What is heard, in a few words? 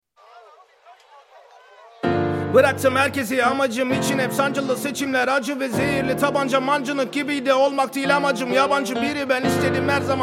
Piano flex